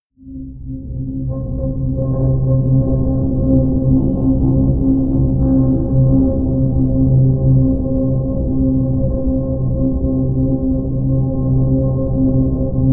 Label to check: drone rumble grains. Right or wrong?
Right